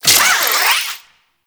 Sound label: Necramech Repair Drone Claw Spin Alt; Enemies; Warframe